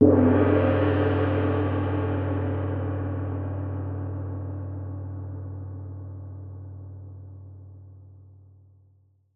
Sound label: Gong